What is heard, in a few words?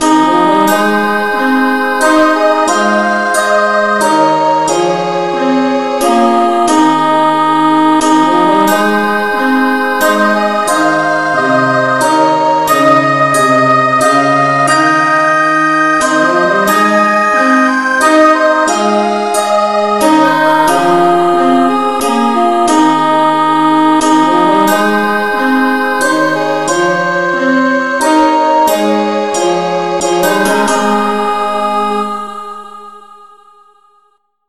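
no vocal key A flat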